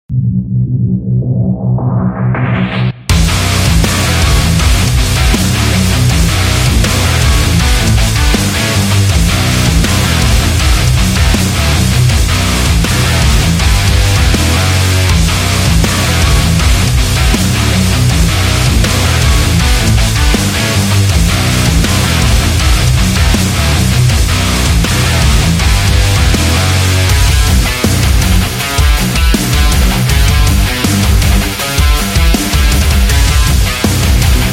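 Sport Extreme, Football percussion background music inspired by samba rhythm, stadium energy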